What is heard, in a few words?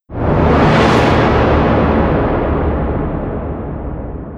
thunder